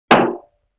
Bass Drum Rim Shot; Vintage Cartoon; Drums & Percussion

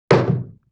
Wood Hit; Vintage Cartoon

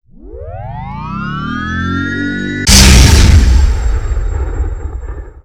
Power Up & Blast, Sci-Fi; Sound Design

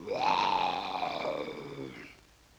LoFi Synthetic Lion Roar; Vintage Cartoon; Comedy Vocals

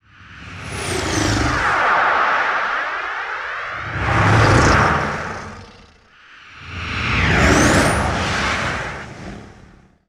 Super Jets Overhead; Sound Design

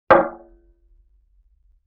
Barrel Hit Medium; Vintage Cartoon; Drums & Percussion

